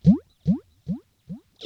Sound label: RIPPLES R, Funk Guitar; 90 s Sample CDs; Zero G